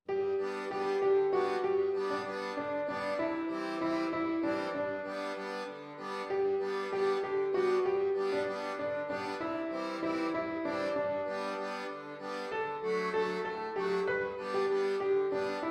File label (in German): Akkordeon